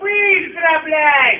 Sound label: door saw; svencoop